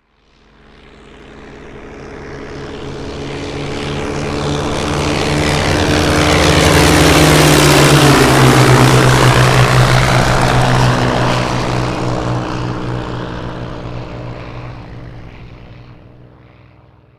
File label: stuka, vehicle; mohaa